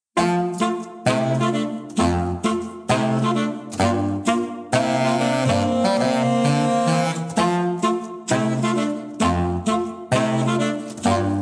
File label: Sax